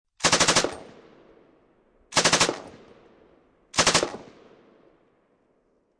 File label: M249 3 Bursts Barrel, Machine Gun; Machine Guns; VRSonic